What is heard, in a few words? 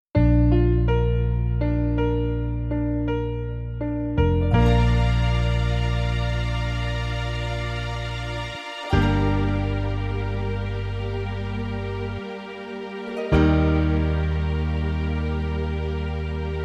brass minus